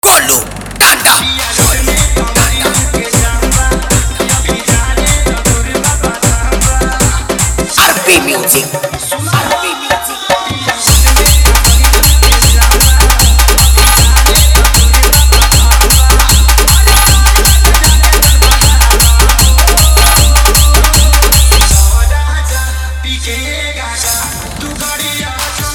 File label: BolBam Remix